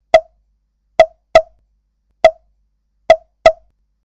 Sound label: wood sound